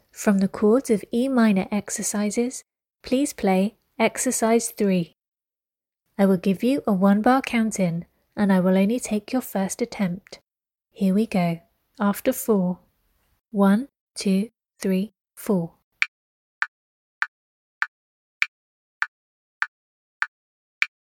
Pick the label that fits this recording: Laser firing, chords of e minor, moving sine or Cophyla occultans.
chords of e minor